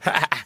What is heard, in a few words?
laugh